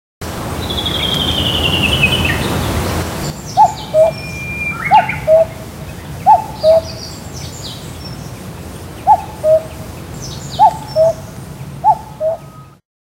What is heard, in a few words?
kakow, bird; azamidani